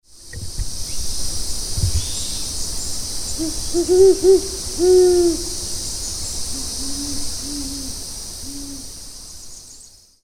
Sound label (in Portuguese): Adulto, a maior coruja do continente